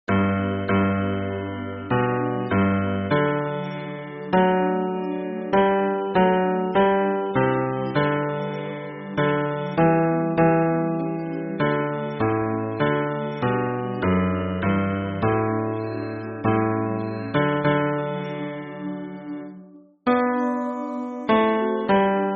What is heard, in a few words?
hymn bass; 末日聖徒イエス・キリスト教会